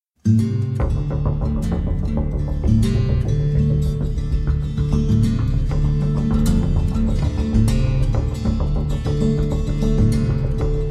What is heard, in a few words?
Blues